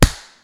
Hit; Remember Me

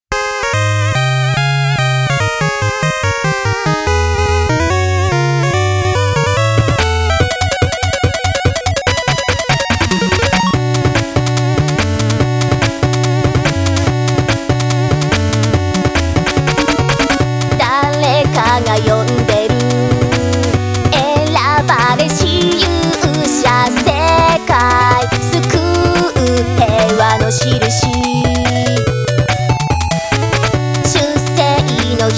Theme Song Fantasy RPG ver.; Zettai Hero Project

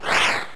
Hi Jump Kick; pokemon reborn e19 2022

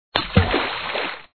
splash large; Raft Wars

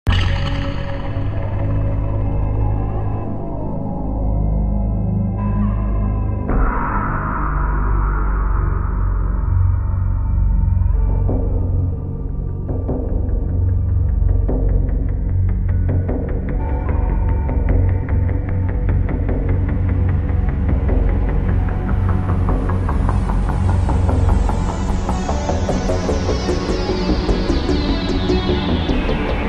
Music Main Menu; Mass Effect Galaxy

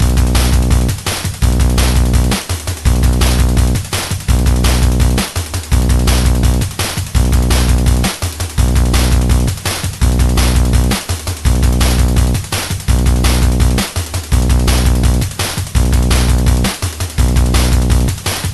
Multiball Ready Prelaunch, pinball; The Addams Family; Bally

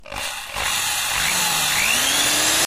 Drill Start; friday the 13th killer puzzle

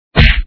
Punch

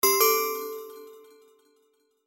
Bazaar Register; Twist of Destiny

